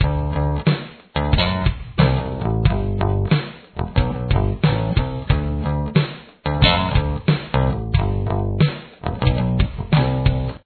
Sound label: bass